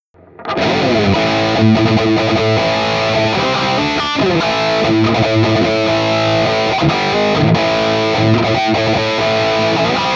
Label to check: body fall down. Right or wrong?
Wrong